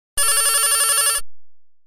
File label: telephone